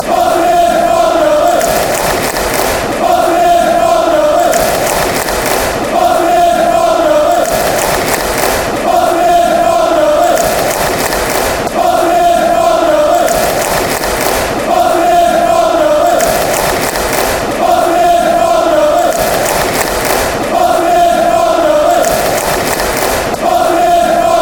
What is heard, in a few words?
barmy army fanchants; martinez